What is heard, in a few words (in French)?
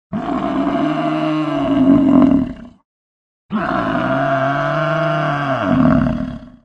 buffle cri